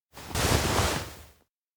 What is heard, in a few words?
peek worried; anemoi